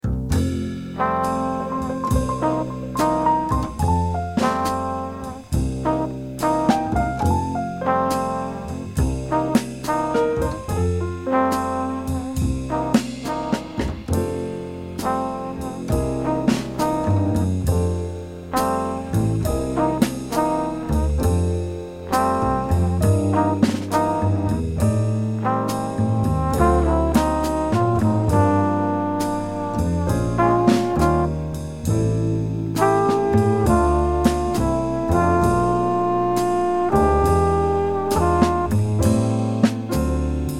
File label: minus melody